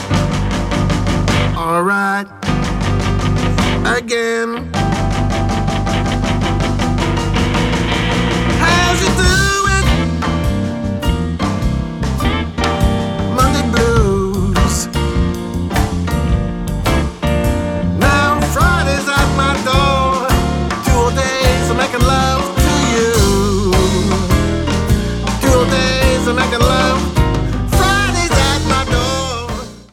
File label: blue jazzy